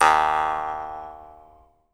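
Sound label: Jaw Harps, Pitched Percussion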